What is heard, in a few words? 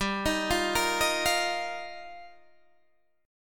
G Minor 7th Sharp 5th, Guitar, DADGAD; Gm7#5; arpeggiated